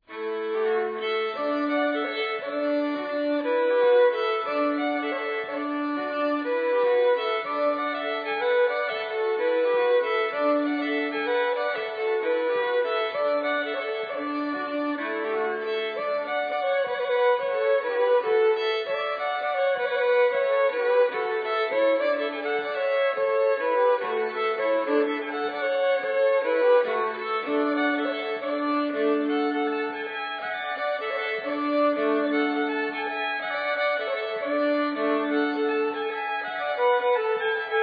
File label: Springar Fiddle; Etter Myllarguten